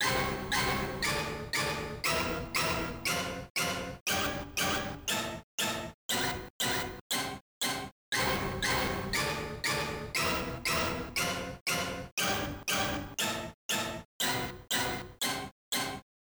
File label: Speaker Check; Salamander 2; Konami GX; arcade